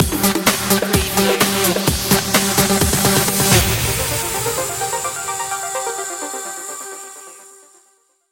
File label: club breakz stinger